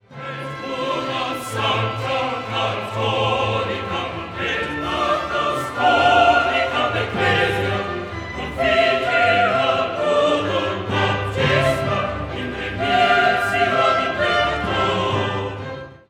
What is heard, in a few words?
Credo Et unam sanctam